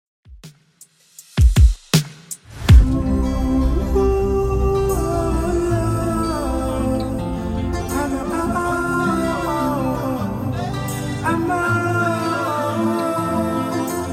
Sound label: Reggae DJ Friendly Edit 80bpm